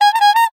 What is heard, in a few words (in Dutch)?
Clown Neusknijp